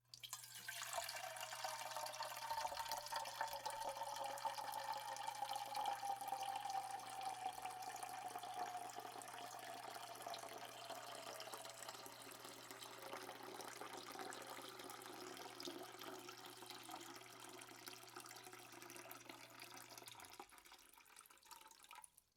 water pour into surgical tray

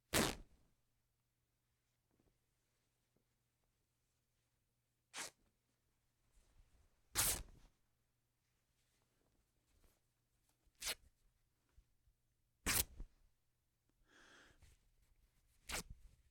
cotton rip

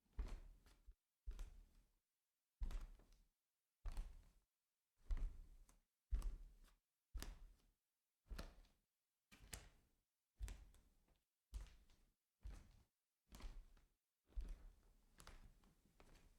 walk barefoot on wood, foley

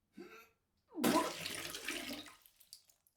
vomiting close

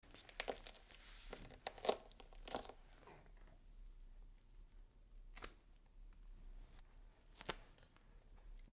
wet meat rip

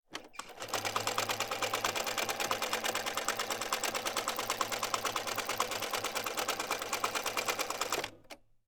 sewing machine treadle

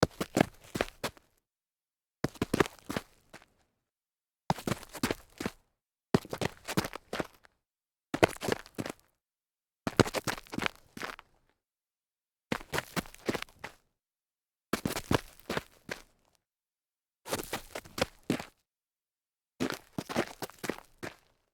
dress shoe stumble light grit

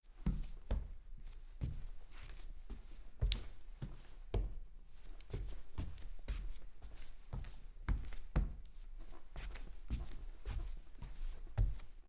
socks on wood turns, foley

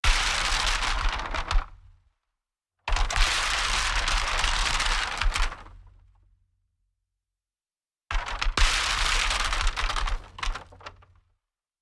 bamboo drop and tumble

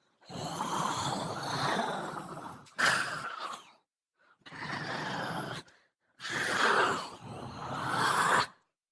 female monster growls

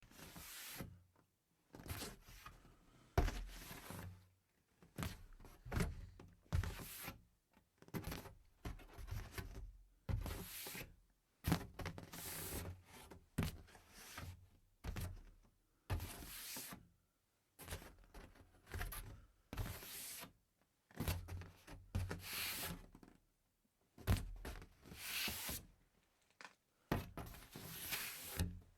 foam board plased on easel

